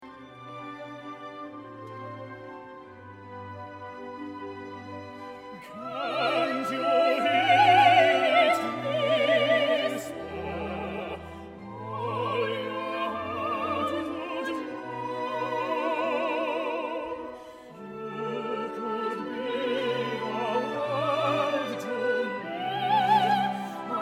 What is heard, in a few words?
Duet